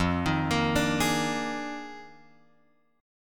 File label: F Minor 13th, guitar chord; Fm13 Chord {1 X 1 3 3 4} arpeggiated